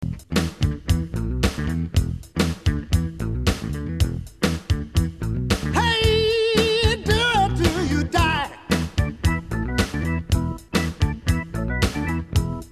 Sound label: funky music